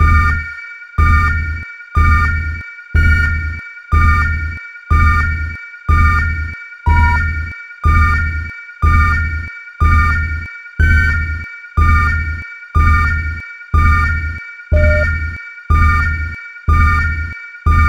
sign wave

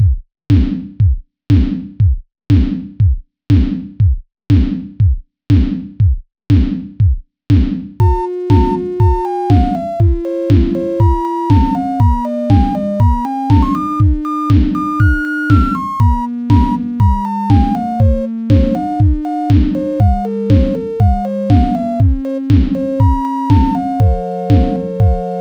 Sine Wave

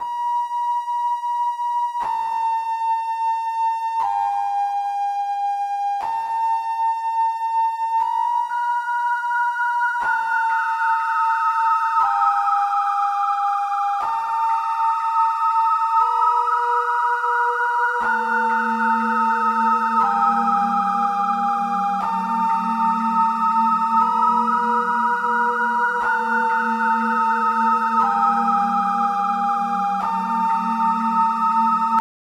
sine wave project

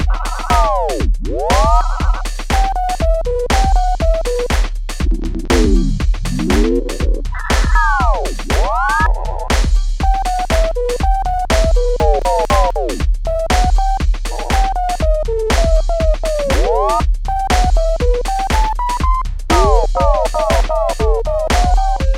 sinewave

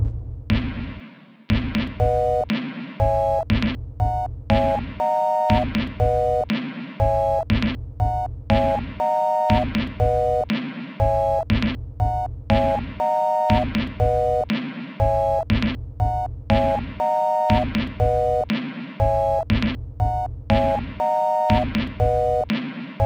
sine wave song

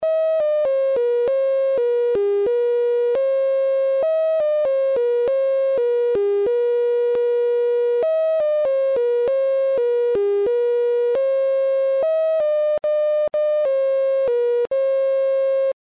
sine wave

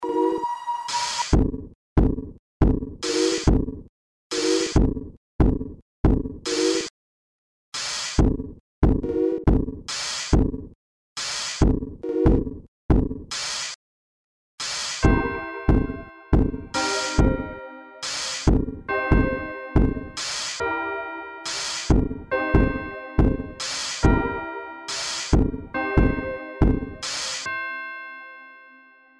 sinewave

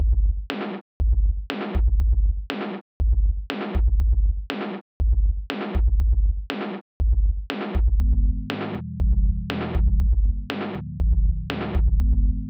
sine lead

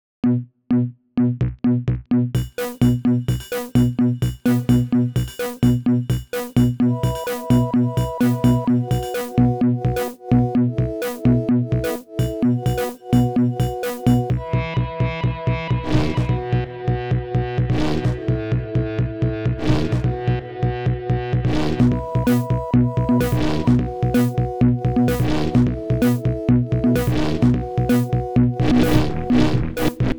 sine wave

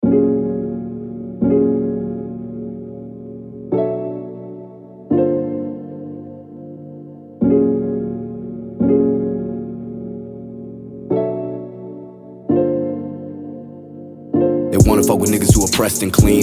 Freestyle

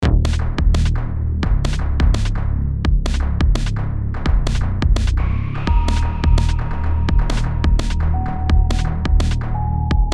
MIDI

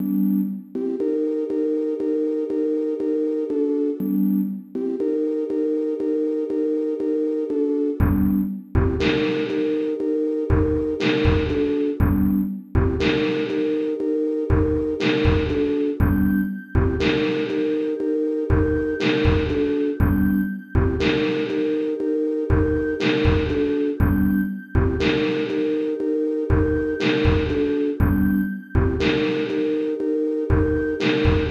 Sinewave Assignement

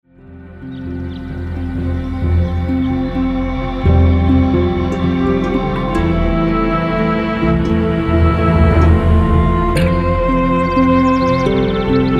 relaxing guitar song

